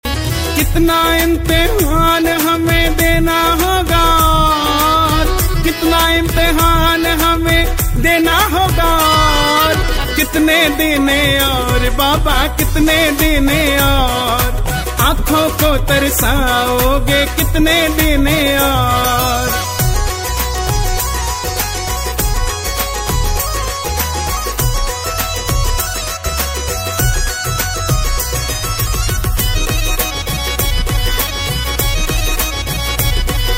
Bhajan Khatu Shyam Bhajan

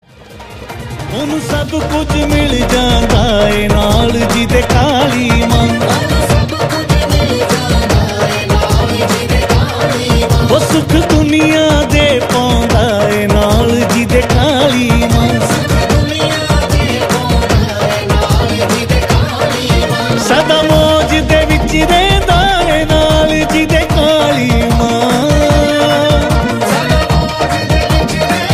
Kali Mata Bhajan

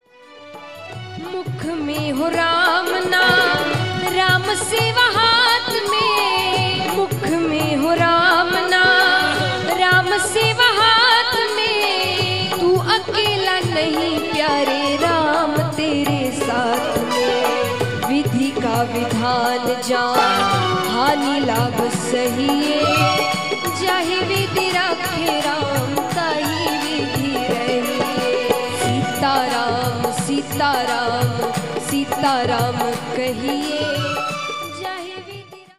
Trending Bhajan

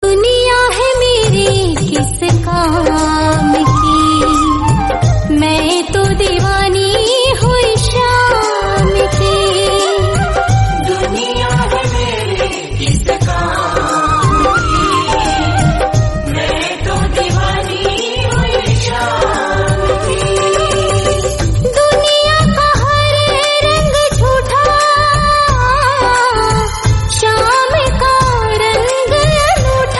Khatu Shyam Ringtone

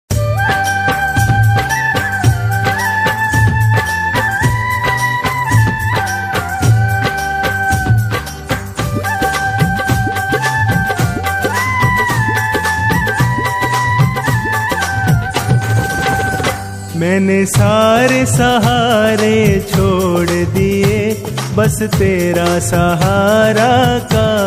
Khatu Shyam Bhajan; Baba Shyam Superhit Bhajan

